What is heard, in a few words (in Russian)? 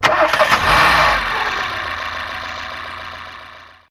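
звук завода дизельного автомобиля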